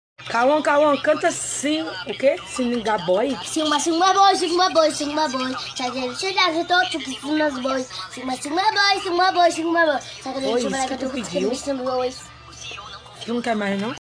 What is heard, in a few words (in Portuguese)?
canta